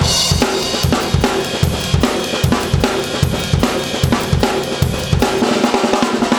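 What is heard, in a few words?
150 bpm, beat loops; Zero-G; Monster Beats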